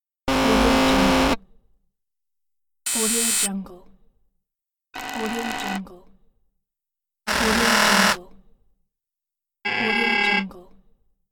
wrong guess buzzing; audioefx

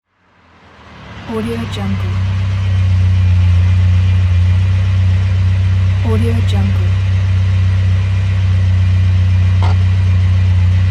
car approach stop idle shut off

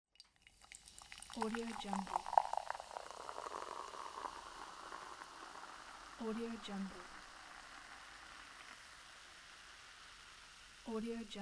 soda can pour

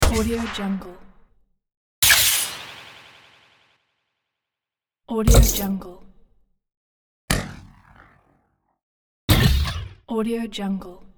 designed game futuristic gun shot

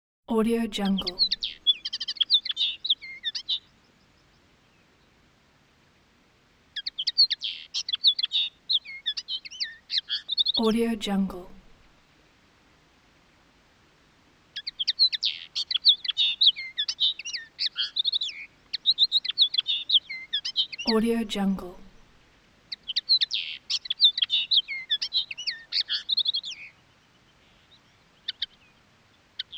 bird chirp

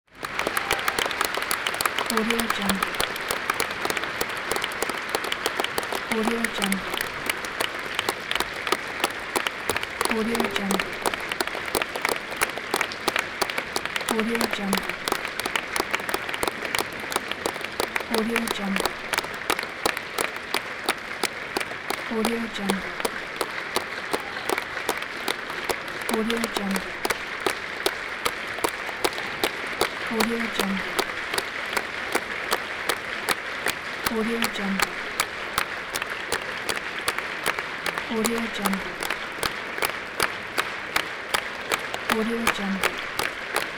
applause and ovations

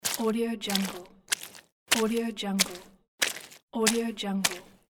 footsteps mud; audiomechanica2

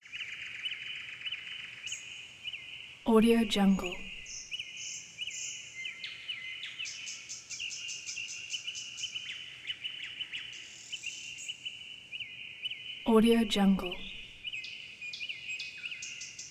forest birds constant light air tone

